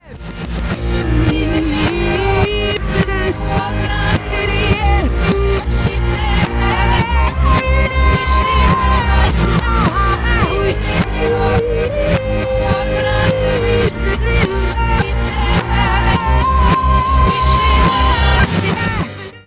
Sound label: song reverse